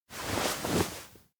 bow; fumiya; anemoi

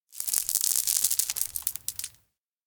konpeito drop many; anemoi

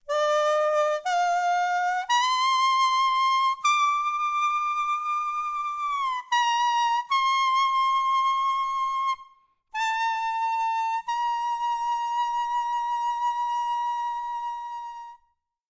kusabue; hiromi; anemoi パッケージ版

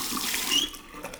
turn off the faucet; anemoi

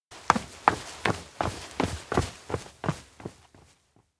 steps indoor wind corridor run carry; anemoi パッケージ版 1.1

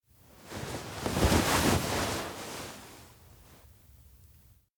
futon rollover; anemoi

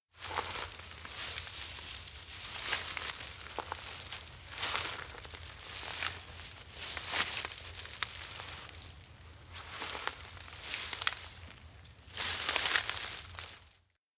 steps outdoor grassland walk possessed; anemoi

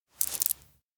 konpeito take bag two; awayuki; anemoi パッケージ版

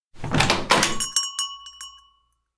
door open; lair; anemoi

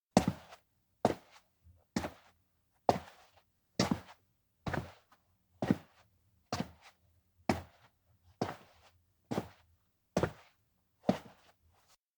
steps indoor hall walk; multiverse; anemoi パッケージ版 1.1

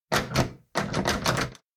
door adult house locked; aino; anemoi

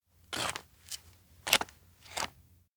steps outdoor ritual granny step out; anemoi

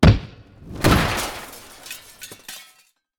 impact sound; dongassyan; anemoi パッケージ版 1.1

